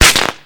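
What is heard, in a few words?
bullet cookoff; Modern Warfare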